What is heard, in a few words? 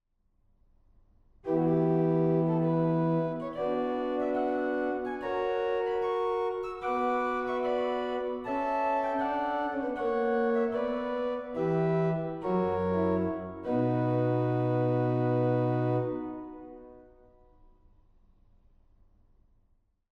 Principal 8, organ; goenn